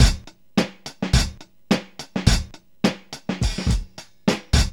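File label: 106 bpm, Funky loops; Zero-G; Funky Elements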